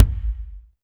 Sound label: Kick, Alesis DM5